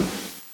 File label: SNARE, NES DPCM